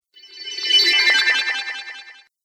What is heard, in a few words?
Fantasy Mage Spell, magic; Magic Sound Effects